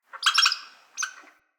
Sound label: Squirrel Trapped Call; Encounters; Animal Rescue